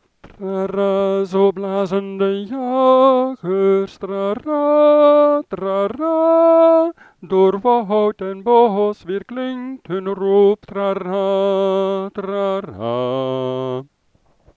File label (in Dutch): muziek herfstliederen trara zo blazen de jagers zang eenstemmig